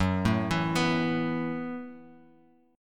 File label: Gb Minor 11th Gb, Bass Standard, chord; arpeggiated; JGuitar